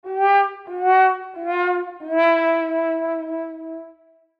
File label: horn fail; sound effects